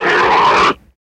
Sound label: Monster Scream Low